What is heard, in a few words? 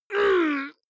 male fight pain groan; comedy-cartoon; soundpool